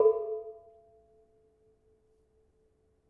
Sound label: Bonang Barung, gamelan